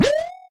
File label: Jump; Spine Examples